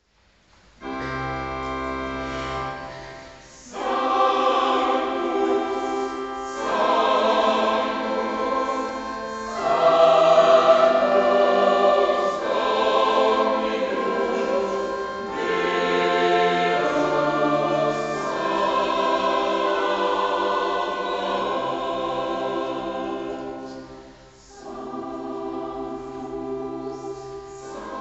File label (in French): messe gounod sanctus; landeron; accord